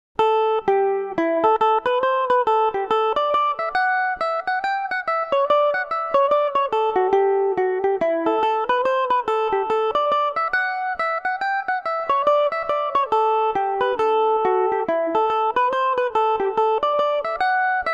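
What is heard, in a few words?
A dorian revelation, Irish mandolin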